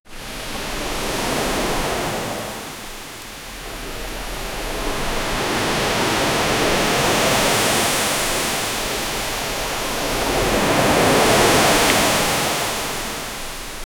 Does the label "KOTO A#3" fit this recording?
No